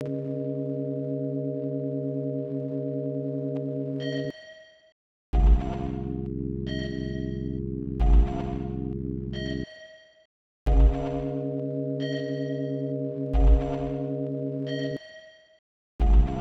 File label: SINE WAVE